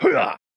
man attack light; soundscrate

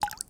water drip single; soundscrate

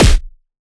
Anime Super Punch

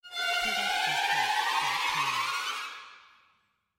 short trem

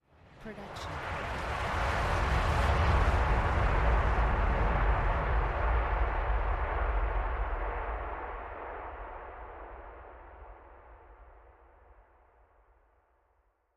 Airy Riser

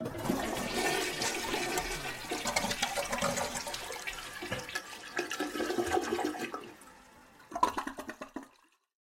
flush long; soundscrate

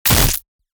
body impact ground

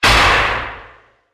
Gun Shot; SoundsCrate

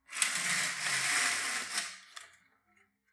curtains; soundscrate

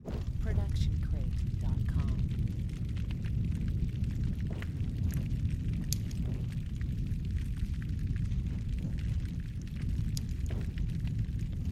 Large Fire; SoundsCrate

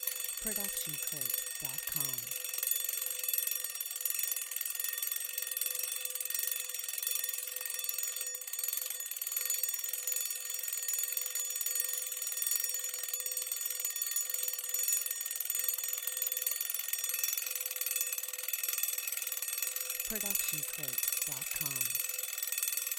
ringing clock; soundscrate

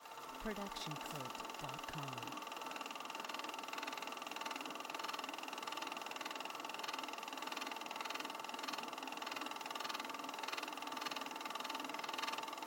VHS rewind stop

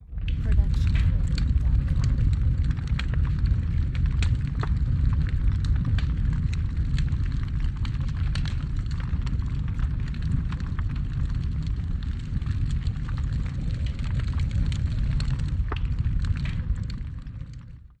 Large Fire; SoundsCrate

